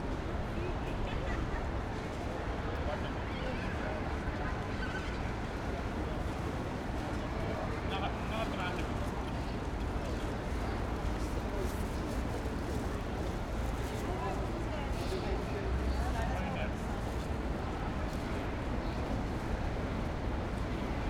BUSY STREET square, some people and few birds, distant construction, Brooklyn Fulton street; New York USA; Busy Streets Ambience